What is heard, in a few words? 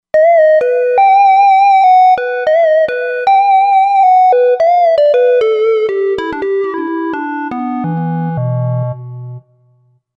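Lead Pointy Square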